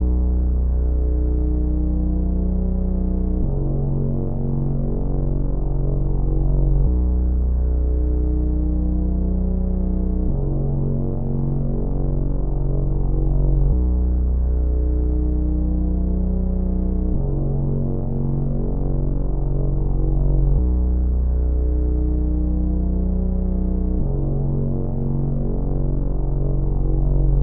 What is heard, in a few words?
140 BPM F# Min Bass; Trap Melody Loops; Loop Stems; Cymatics